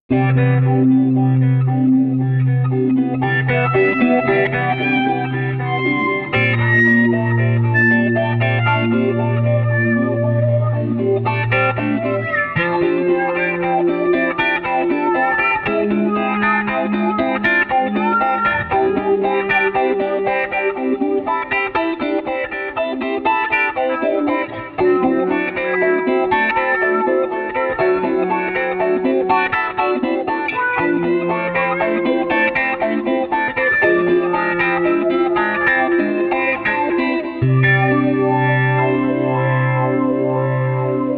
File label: sweeps